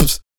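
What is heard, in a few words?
vox percussion, Vocal Planet Jazz FX; Spectrasonics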